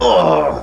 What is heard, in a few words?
death male; maindeath; Odyssey